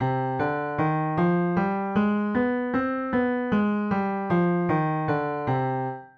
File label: c harmonic minor scale